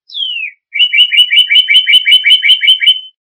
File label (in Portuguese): cardeal sons de animais, som de cardeal cantando